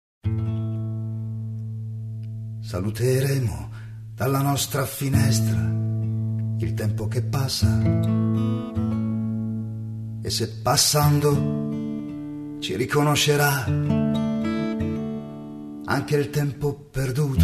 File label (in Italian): Canzone Del Tempo Che Passa